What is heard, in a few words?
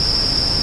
cricket, insects; valve; ambient